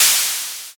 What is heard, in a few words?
open hat